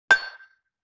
Xylophone Clank G; Vintage Cartoon; Musical Elements

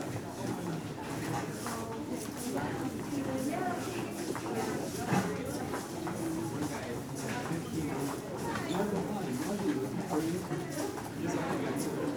School Hallway Interior

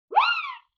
Wind Whistle Zip; Vintage Cartoon; Musical Elements

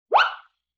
Wind Whistle Zip

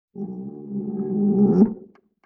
Viola String Finger Rub; Vintage Cartoon; Musical Elements

